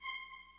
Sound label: hi String; Hitman; Music; China